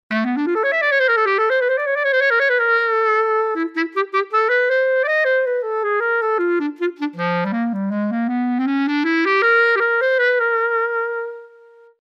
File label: SWAM clarinet